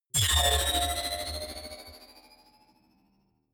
sci-fi magnetic sling big high; energie

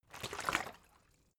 bottle water sparse upend slosh; berufe

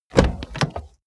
car bmw e46 exterior door front open; Cars

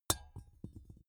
small metal drop wood sharp

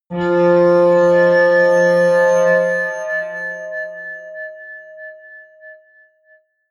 atmospheric feedback synthesizer medium, drones

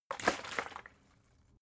bottle water big shake single; berufe

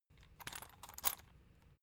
salt mill metallic slow; gastronomie; soundpool

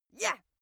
female attack; schreie; menschen

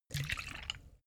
bottle vodka upend; berufe

